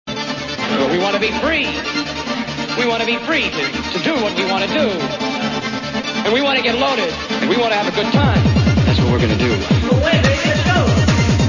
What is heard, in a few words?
resonator waves; kopfuss resonator; scheckel